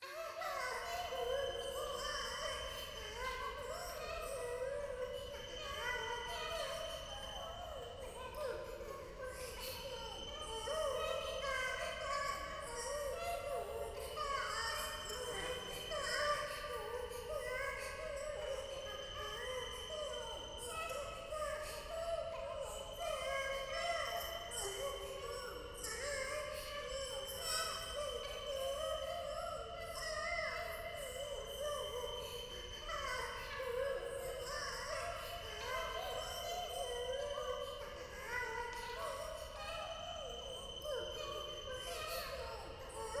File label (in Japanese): 可愛い喘ぎ 山奥